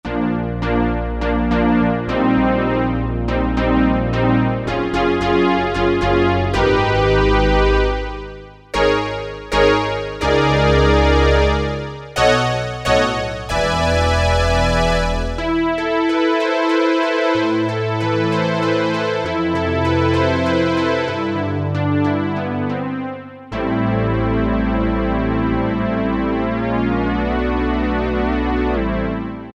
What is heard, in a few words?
JX-10 Synth, Roland W-30; Factory Performance Patches; Factory B